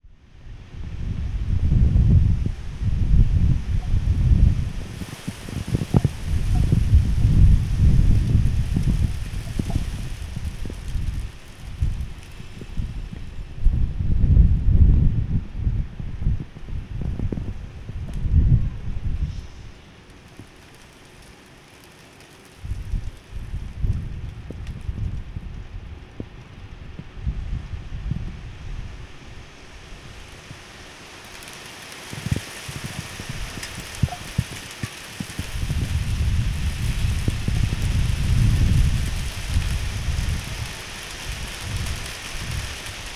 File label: Hail Storm; weather